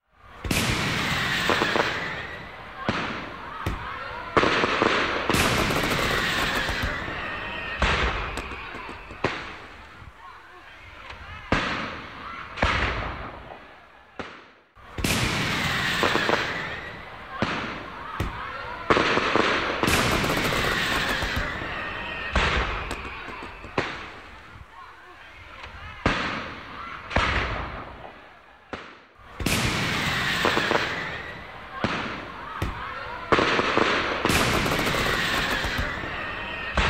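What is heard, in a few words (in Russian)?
shum saljuta, fireworks noise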